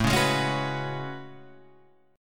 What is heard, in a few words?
A Major 13th, down Guitar Half Step Down; AM13